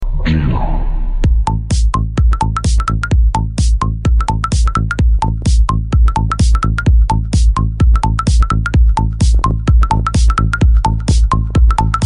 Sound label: Txt Tone